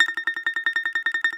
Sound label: BAGLAMA T1HR; E-MU Producer Series Vol. 7 – Old World Instruments